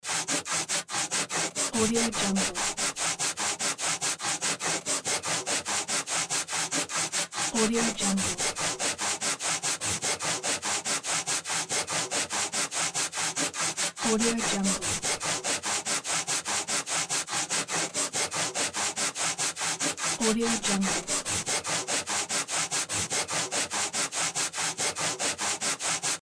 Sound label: wood sawing loop